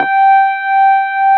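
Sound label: Wurlitzer G4 - R; USB Soundscan vol.09; AKAI; Keyboards Old School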